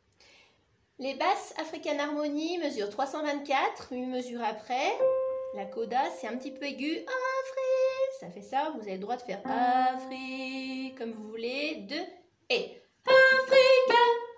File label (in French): basse